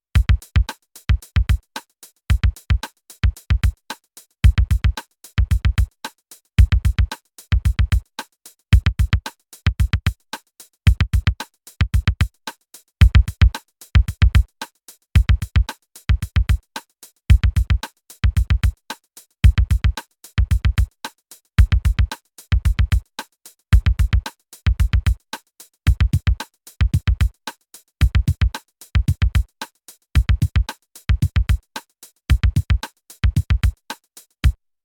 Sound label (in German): 7X7 2 verschiedene 707 Kicks